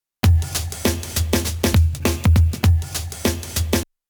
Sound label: Loop Vintage